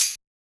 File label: Yamaha DJX 2B, Tamb